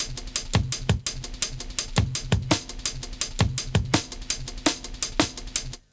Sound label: LOOP, Jungle; Explosive Jungle; USB Soundscan vol.05; AKAI